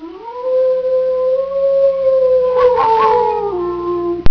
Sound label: wolf; valve